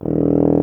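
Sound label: brass tuba; Miroslav Vitous; Solo Instruments VOL-2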